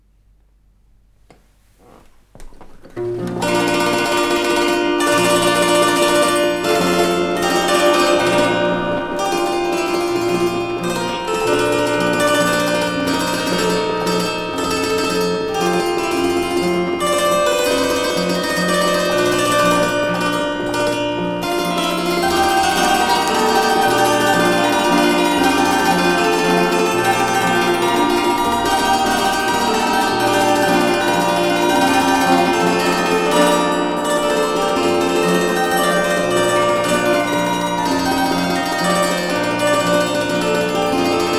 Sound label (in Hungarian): automatikus citera nem felhangolt; 1920 nemetorszag; verklihangmuzeum